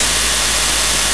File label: DIGIANOISE; AKAI S6000 CD-ROM - Volume 5